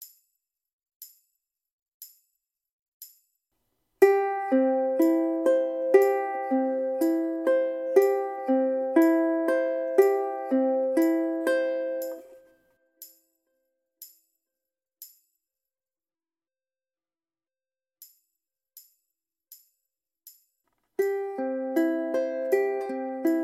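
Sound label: up pattern slow, ukulele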